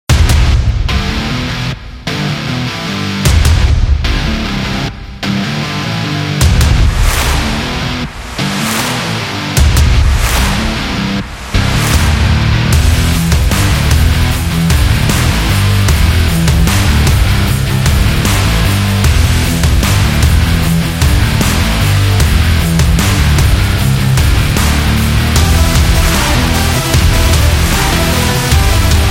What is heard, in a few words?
This Is Hard Rock; electro rock